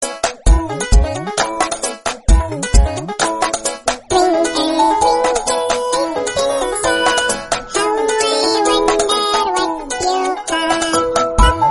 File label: twinkle twinkle little star ringtone